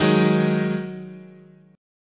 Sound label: D sharp Minor Double Flat 5th, chord